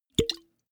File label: potion small open